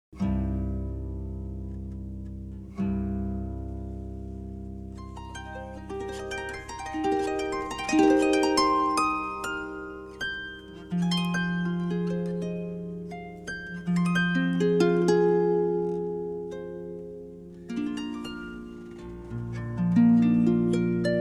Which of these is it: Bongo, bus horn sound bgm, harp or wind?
harp